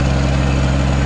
diesel engine run

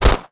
smg 9mm single shot

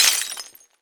bottle break

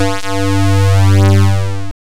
SYNTH

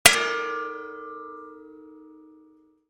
metal plate hit

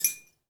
glass hit

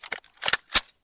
Submachinegun Reload

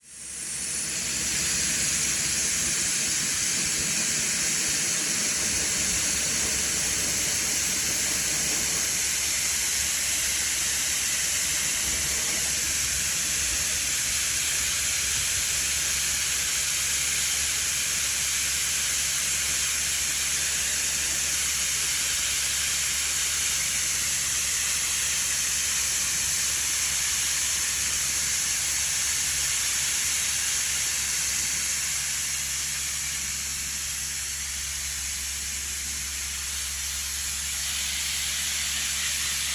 sandblasting

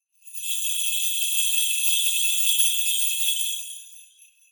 jingle bells

